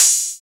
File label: HH HH, Dance